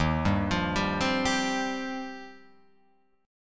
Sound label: D sharp 6th Add 9th, D sharp, down, Guitar, Open F; D#6add9 arpeggiated